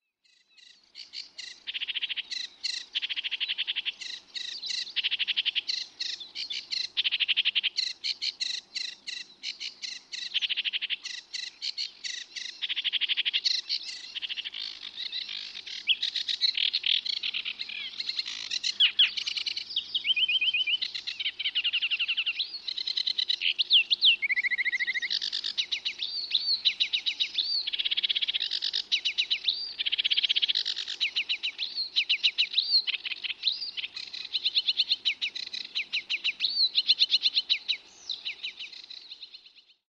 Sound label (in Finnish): ruokokerttunen on ahkera laulaja